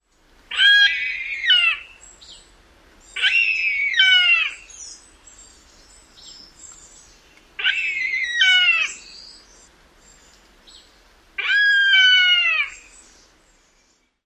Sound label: calls near nest, ZOTAHA